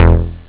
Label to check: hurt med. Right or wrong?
Wrong